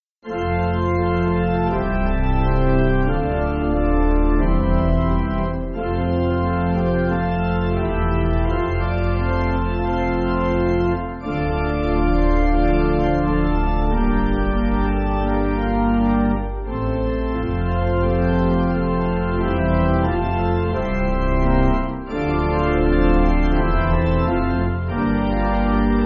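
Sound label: Midnight Sleeping, Chinese, pipe organ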